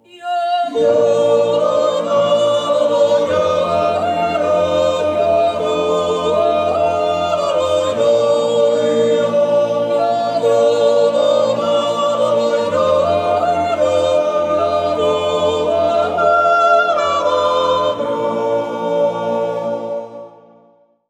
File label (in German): jutz; rehbode